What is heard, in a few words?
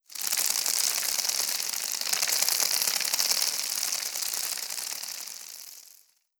Spider Raid Insect; Legend of Keepers